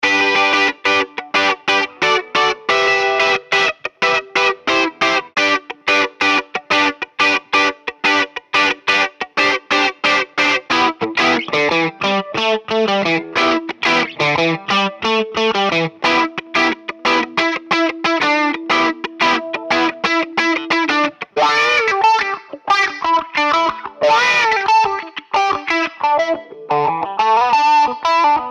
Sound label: Funk